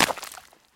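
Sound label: unpleasant short sound of something splashing into water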